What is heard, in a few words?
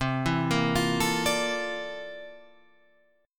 C 13th C down Guitar Half Step Down